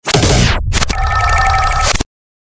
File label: Galery Noise; Sound Ideas - Galery Noise 001